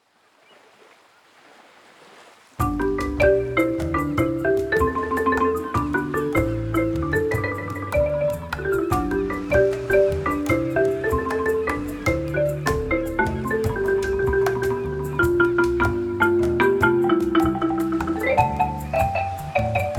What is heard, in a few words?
marimba; deridder; mare di marimba; pianoteq7